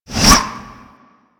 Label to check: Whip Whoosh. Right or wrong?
Right